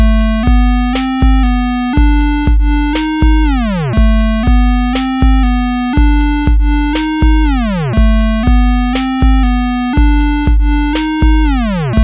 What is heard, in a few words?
analog synth